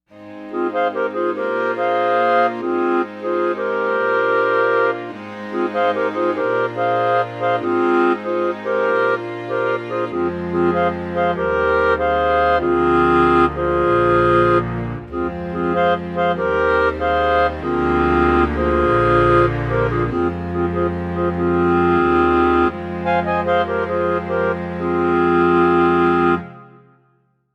clarinets; Jupiter-80

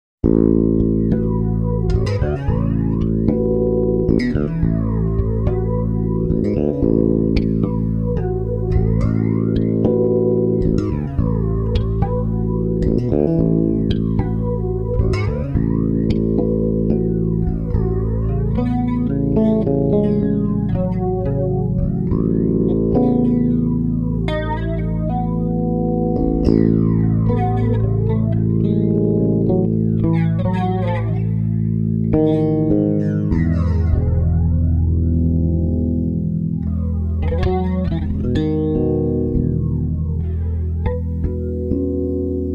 tone bass; bf 3; tone demo

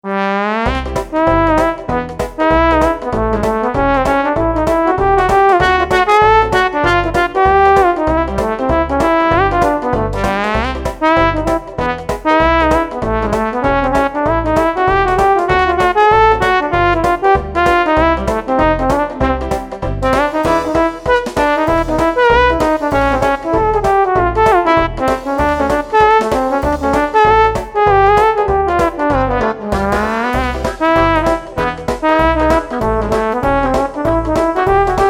articulation trombone

